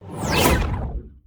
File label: spell attack